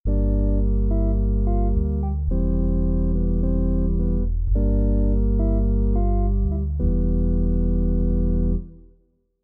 CS80 Pad

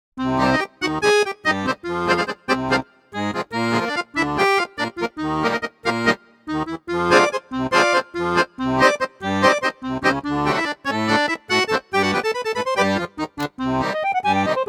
Accordion

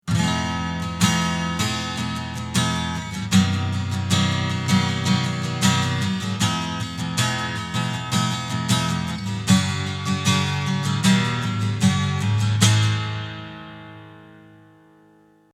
miced big acoustic; pod x3

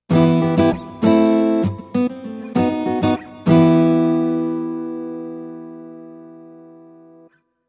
Tyros Mega Hi String Gtr

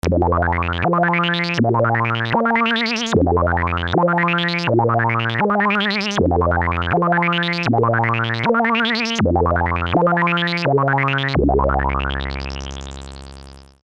gurglet

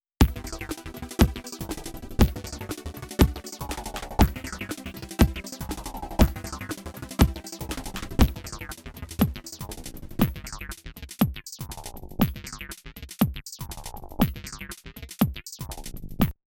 Counting Bubbler Trem

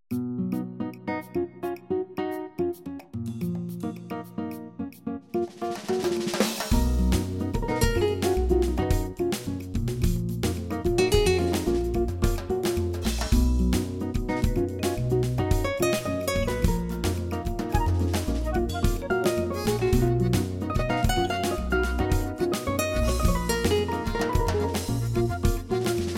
latin satin; ROLFANTOMX7

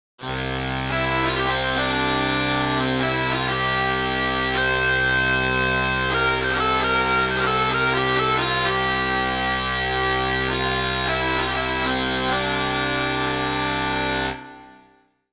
bag Pipe

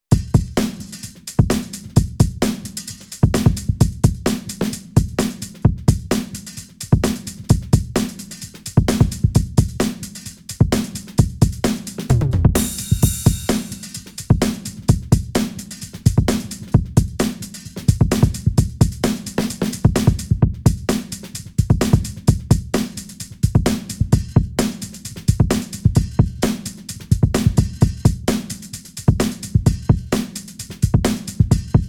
8bk

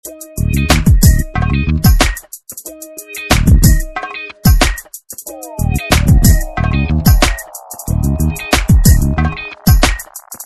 Dice 92bpm

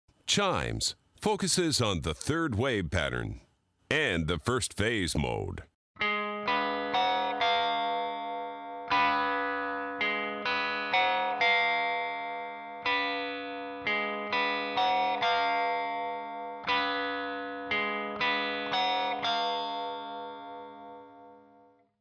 chimes

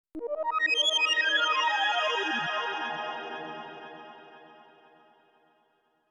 Synth Input

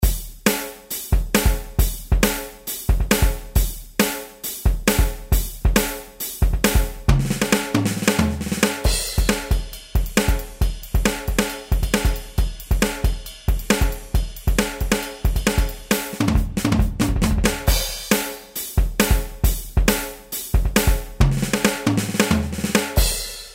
Hard Rock

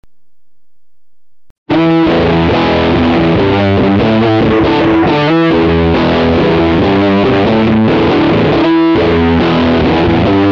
BMuff

